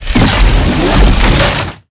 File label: Drone Missile Death; blue archive